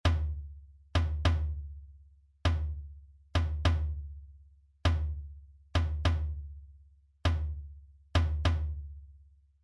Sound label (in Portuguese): Toque - Surdo Mor; fanfarra